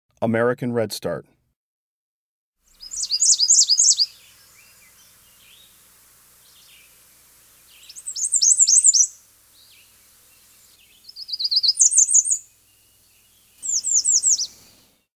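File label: American Redstart, Breeding Birds of the Chicago Region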